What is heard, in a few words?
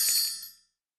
gems